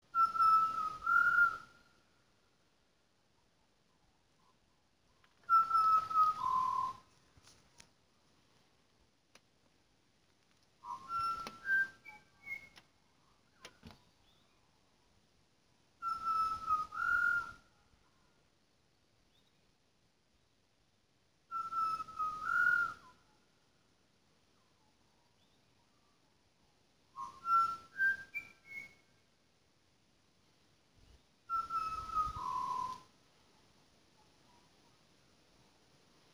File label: bird call